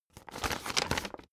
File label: old flip page fast